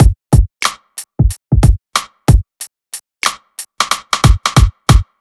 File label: BEAT, Hip-Hop & R&B; USB Soundscan vol.47; AKAI; HIHHOP